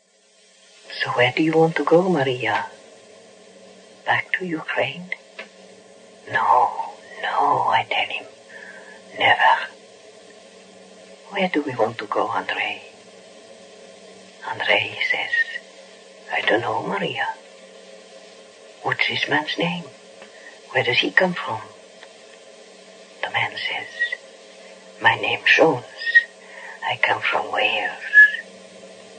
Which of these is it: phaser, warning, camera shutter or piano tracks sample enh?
piano tracks sample enh